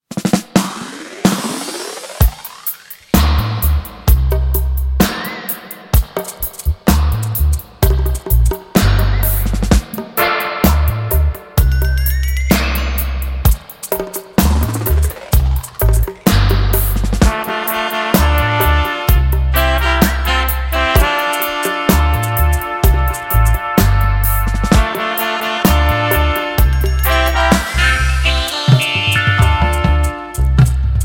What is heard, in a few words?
Quake Dub